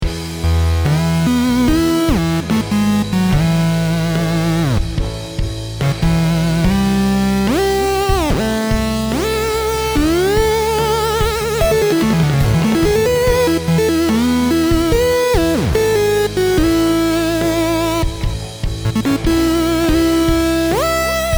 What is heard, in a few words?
song with chip